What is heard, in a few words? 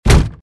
push box land; star-wars-rebels-team-tactis